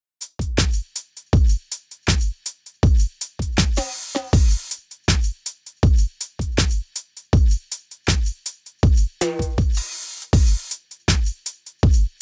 Drums

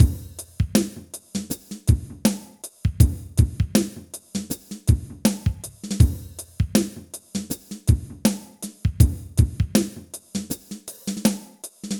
Drum Kit 4 bar loop 80 bpm; Mixter Plus Drum Kit

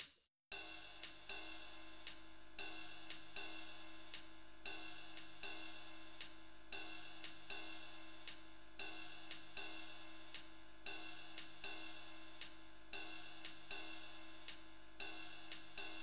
drums

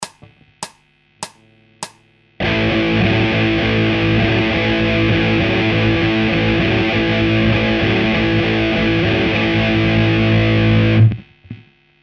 Hard Guitar Loops

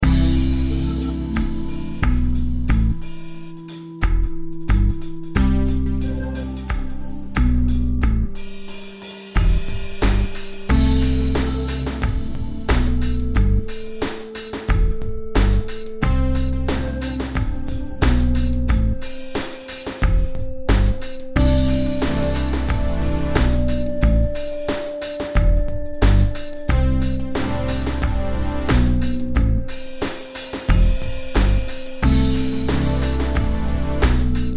Em90 Sparse Groove

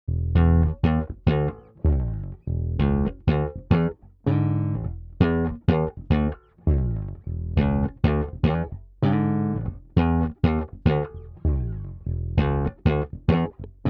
Bassy Thing; admiralbob77

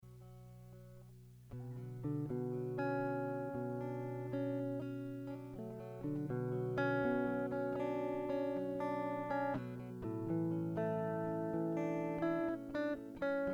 Ambience of Uncertainty

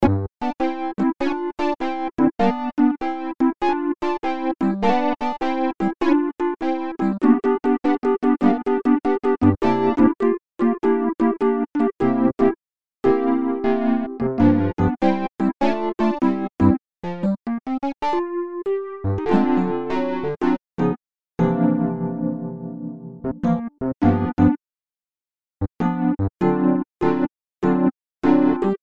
e piano loops

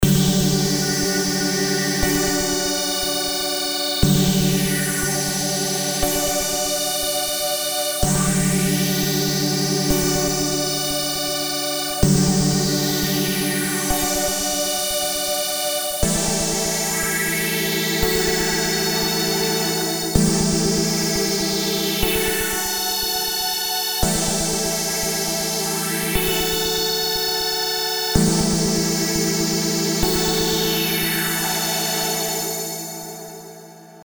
Retro Synth Chords

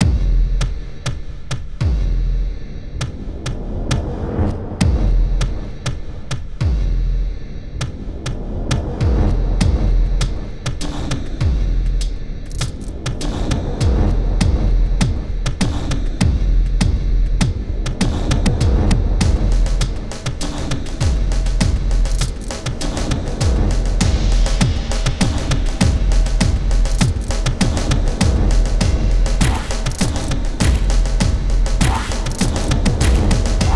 Cinematic Kit

